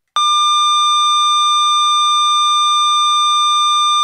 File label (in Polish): ton